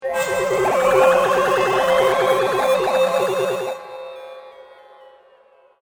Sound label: Aliens Engage Hyperdrive; Alien Sound Effects; science fiction sound effect